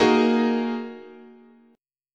A sharp 7th Suspended 4th Sharp 5th, guitar chord

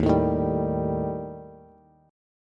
Eb Major Flat 5th, Bass; EbMb5 strummed; 11,12,13,12; JGuitar

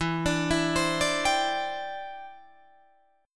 E Minor 7th Sharp 5th, down, Guitar, Drop D

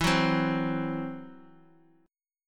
E Suspended 2nd Sharp 5th E, down; Esus2#5 strummed

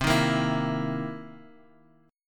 C Minor 7th Flat 11th, C, chord; strummed; Cm7b11

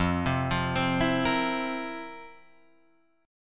F Major 7th Suspended 4th, Guitar, Open F; FM7sus4 arpeggiated; JGuitar

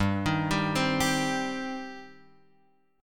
G Suspended 4th Sharp 5th G, Guitar; Gsus4#5 Chord {3 6 5 5 X 3} arpeggiated

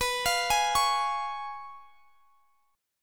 Db Major B; Db/B; arpeggiated

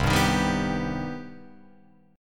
C Minor Major 7th down Guitar Open F; strummed; JGuitar

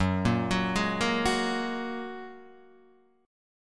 F sharp Major Add 9th, Guitar DADGAD

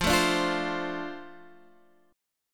F 6th F down Guitar DADGAD

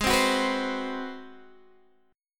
Ab 7th Sharp 9th Guitar Open D, chord

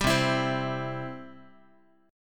C Major, Guitar; strummed; JGuitar

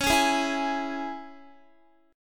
Db Minor, Guitar; strummed

